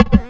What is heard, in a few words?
PERC FX, Big Beat; Big Beat Samples & More; AKAI